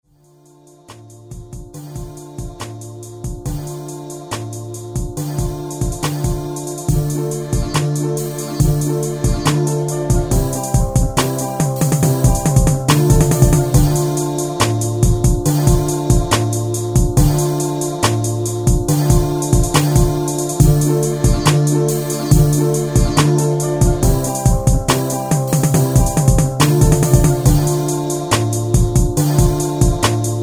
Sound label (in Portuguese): beat